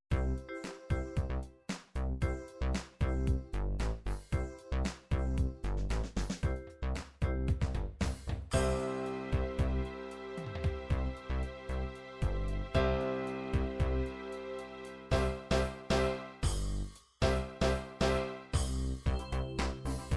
dance 80 dance, midi

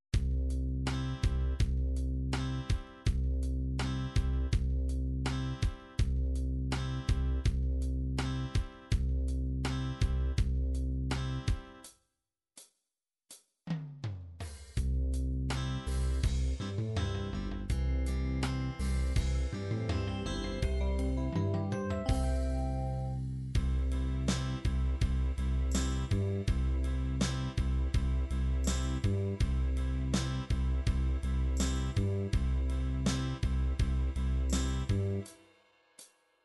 Ballad 8beat, midi

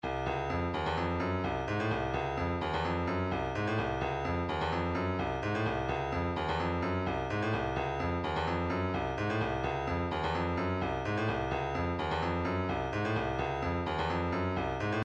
128 hihat, midi

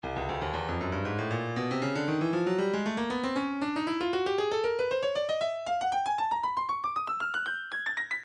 stylus breakbeat midi files 117 bpm sizzle

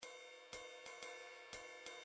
midi drums ride hat

